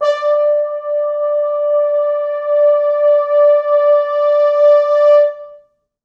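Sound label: 4 French Horns, Horns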